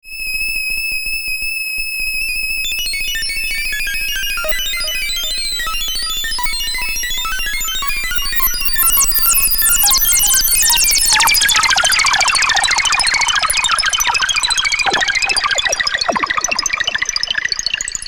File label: SH-1000 FX2 delay and reverb